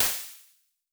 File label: Large Bathroom, Impulses